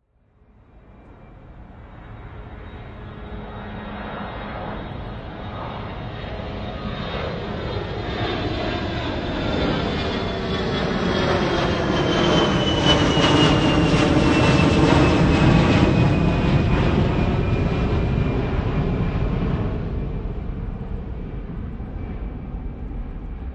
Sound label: Airplane Fly By, Jet aircraft passing by; Airplanes